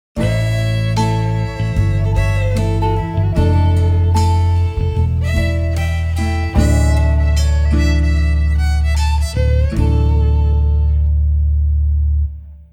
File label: Cowboy Sting